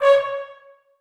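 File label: trombones staccato c#5; Sonatina Symphonic Orchestra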